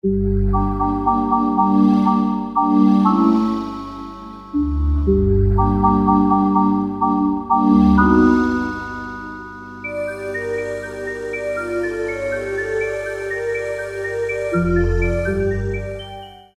Cave; Factory Presets; Preset bank 1

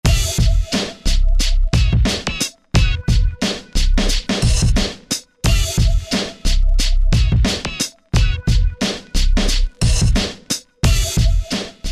HipHop East

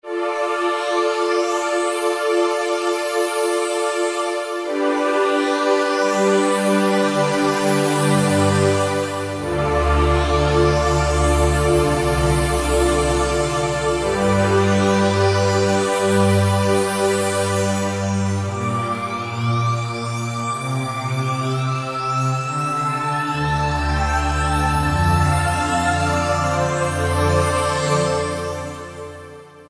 Skydiver, Yamaha PSR-3000 choir & pad; Factory Voices

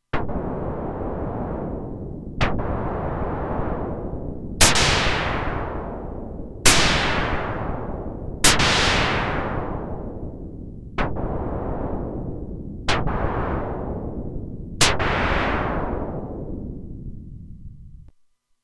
Echo Explosion, Roland Alpha Juno-2; Original Sound Chart patches

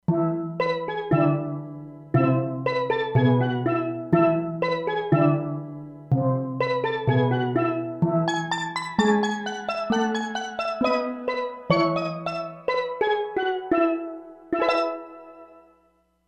Stereo Steel, E-mu Proteus-3; Factory patches

